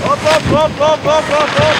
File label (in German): abfahrt anfeuer; SkiAlpin2005